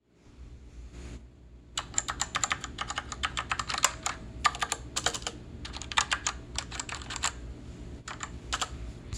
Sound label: cougar KB